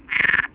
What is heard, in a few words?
perezi, waterfrogs